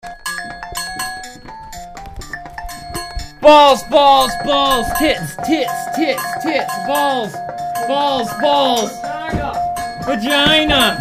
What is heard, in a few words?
keyboard jam